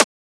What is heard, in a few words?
Transient; FLPRO